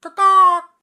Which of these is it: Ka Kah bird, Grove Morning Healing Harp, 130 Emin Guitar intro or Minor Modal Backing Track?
Ka Kah bird